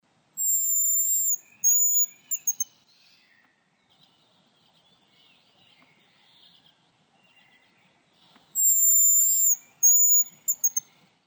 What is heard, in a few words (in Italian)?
Francolino di monte Bonasa bonasia, Versi Animali; Il Forum della Fotografia Naturalistica